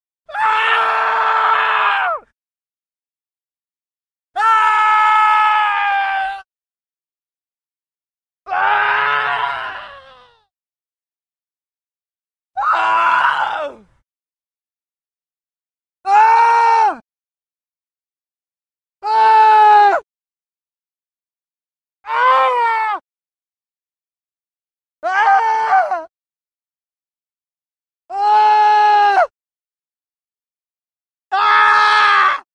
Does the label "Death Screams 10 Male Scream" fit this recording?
Yes